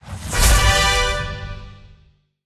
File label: Quest Completed; Candy Crush Soda